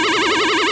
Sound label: Mirror Shield Reflect Fire Loop; Zelda; OOT